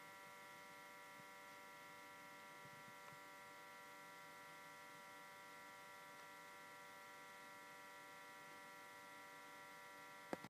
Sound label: Bolt Fan